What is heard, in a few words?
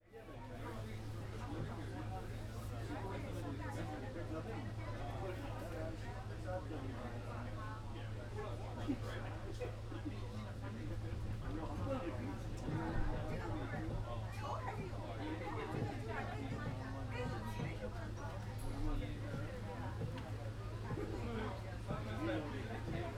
Train, Interior, Room T; City Sounds